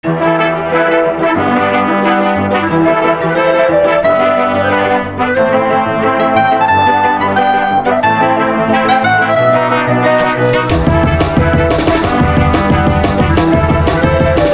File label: Waltz